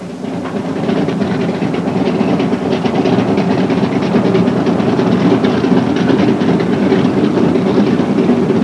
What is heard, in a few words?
TWIN ENG; trasporti; treni